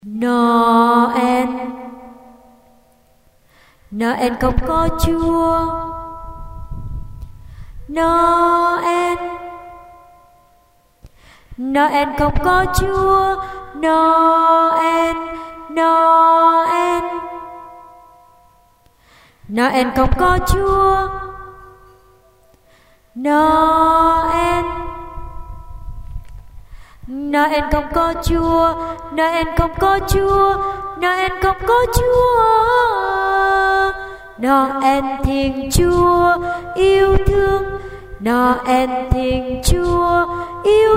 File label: basso